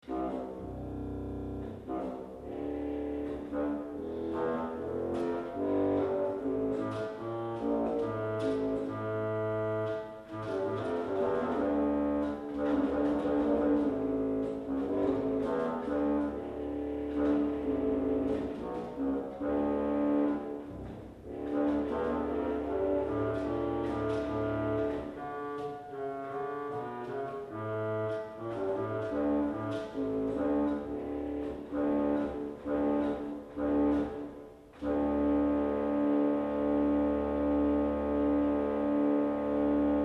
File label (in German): Pos 16, Orgelstimmen